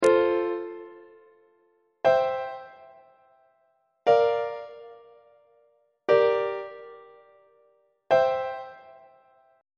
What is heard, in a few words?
e sharp major half cadence on treble clef